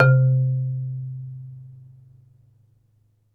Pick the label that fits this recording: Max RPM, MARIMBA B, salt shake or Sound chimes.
MARIMBA B